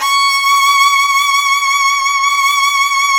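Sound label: violin, Virtuoso Strings, SOLO VN, C#5; ILIO; Kirk Hunter Virtuoso Strings VOL-1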